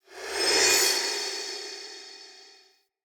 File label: Sword Throw Wind Up; Weapons; Player; Swords; Fenn Jotar Sword